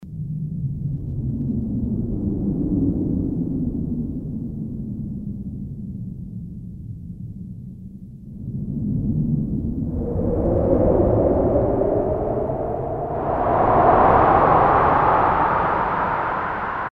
Dust Storm, Roland Juno-106; Factory Preset

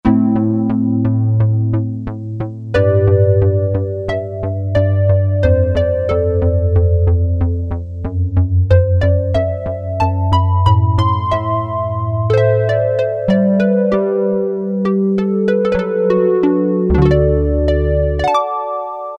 Perc. Pluck, Roland Juno-106; Factory Preset

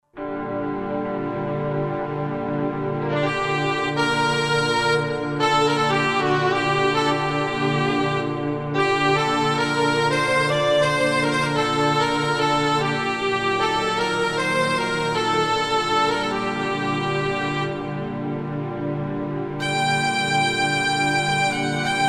Celtic Ensbl

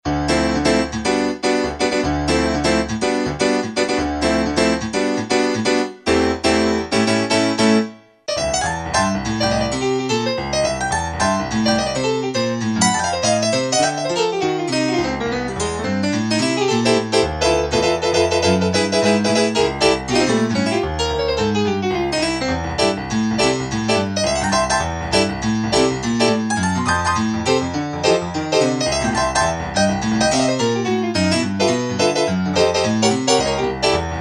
Bright Piano, Kurzweil K2000; Kurzweil K2000 V3

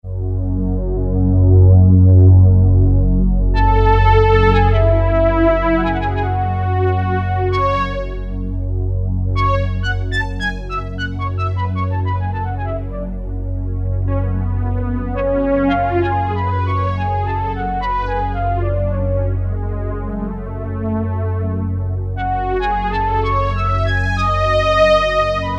Orchestrated Flute, Roland MKS-70

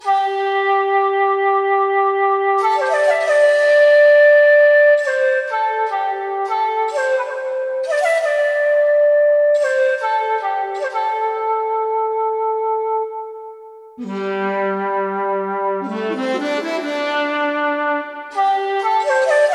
Shaku Zulu, Roland D-70; Factory Performances

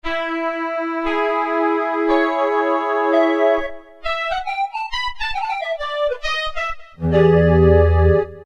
Breeze Pipe, Roland PN-D50-03

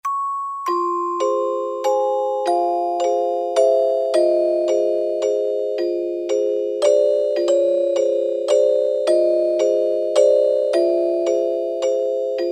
CELESTA, Yamaha PSR-300; Voices

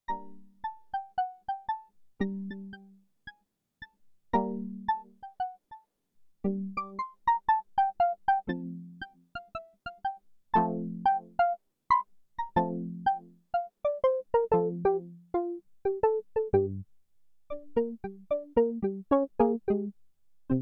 STRINGS; Factory

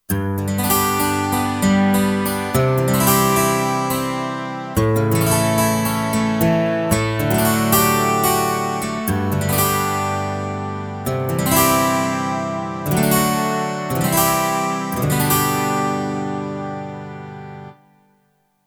Strummer Guitar, Kurzweil K2500X; Factory Programs

